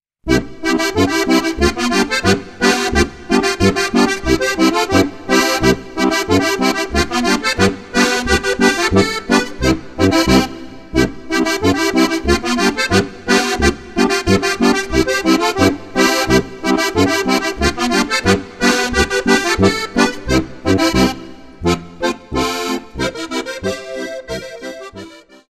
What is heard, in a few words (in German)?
boarischer